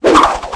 flip transition; Tony Hawk's Project 8; XBOX

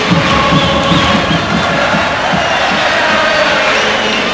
Chant, Crowd; David Beckham Soccer; XBOX

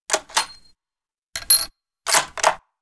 Bolt Action Reload; Weapons

